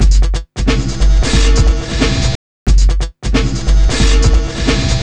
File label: builder beat 090bpm; Zero-G; Downbeat Dance Grooves